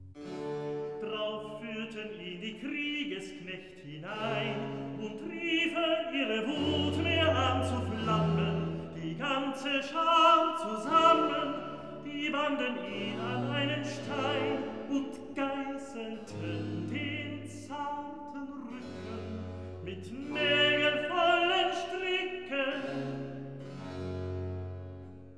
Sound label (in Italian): Recitativo; Drauf führten ihn; Brockes Passion